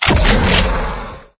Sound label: deploy, weapons; cstrike; AWM MONSTER